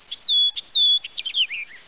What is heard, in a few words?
death; quake2; prabbit